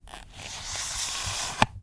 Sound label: medieval seax draw from sheath slow; still north media